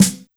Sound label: Snare H Soft